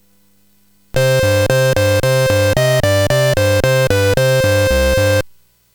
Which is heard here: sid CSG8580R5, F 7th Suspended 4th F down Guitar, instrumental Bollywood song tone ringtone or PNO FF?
sid CSG8580R5